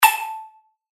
Pluck Shot A; synth shots tech house; Trooper; revealed

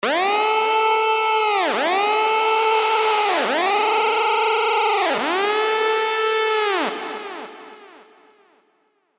SOS; revealed serum 2 hard bass meltdown

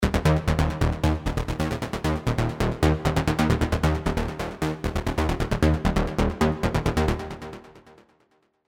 Rolling Loud, techno bass; revealed diva techno bass vol 2

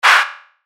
FX Hat, cymbals; revealed cymbals vol 1

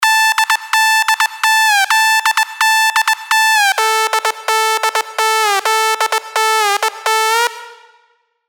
LD, sylenth1 dirty dutch leads; Clubbin'; revealed sylenth1 dirty dutch leads vol 1

